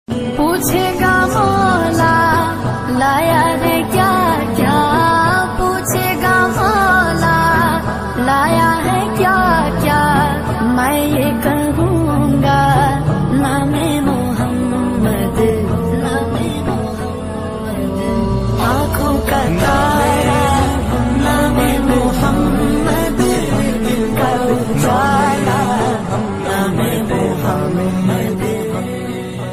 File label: Naat Ringtone